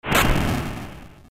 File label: fire; ff3; ff3us